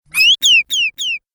Arcade Game Bird Sounds; Video Game Sound Effects; Retro Inspired Sound Effects - Think Nintendo, Atari, C64

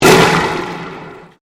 Convolution Pulse

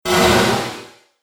Synthetic Crash Sound, impact

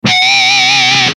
Musical Metal, Musical Metal Guitar Squeal; Blastwave

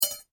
impact metal small; Materials

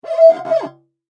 Foley Glass Squeak Clean Window Single; Squeaks; Objects

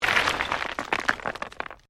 STONES MOVE

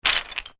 toy bricks wooden bag movement; Bag Of Toy Wooden Bricks Movement; Miscellaneous Toy; Toys; McKinneySound

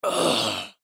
Exhale Grunt Element Male 20 Yrs; Breathing; Blastwave

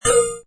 Access, Servo Panel Sound; Button Sound Effects; Multimedia; Lynne Music

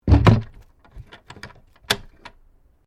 Door Pivot Wooden Bookcase Close Key Lock; Various doors

